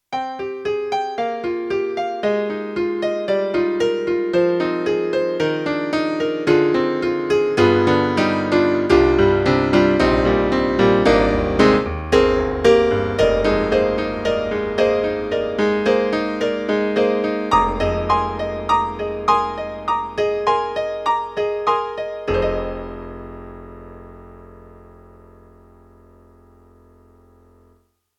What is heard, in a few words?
YAMAHA MDP-5 GS a.piano